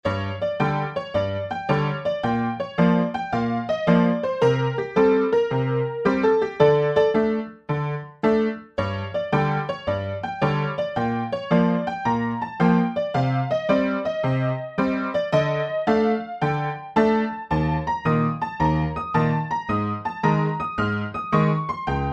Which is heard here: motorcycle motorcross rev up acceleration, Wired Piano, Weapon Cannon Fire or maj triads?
Wired Piano